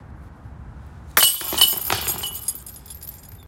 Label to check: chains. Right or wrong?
Right